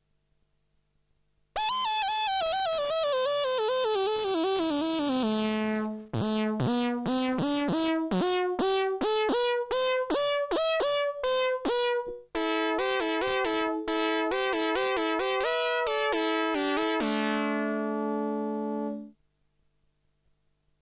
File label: ARPy Lead; CS-30; A straight forward take on a classic Arp Pro-Soloist sound. The CS-30 does a really convincing Arp sound.